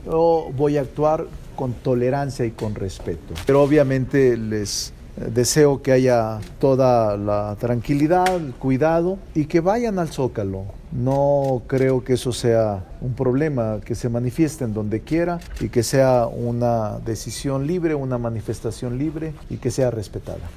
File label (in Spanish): MARCHA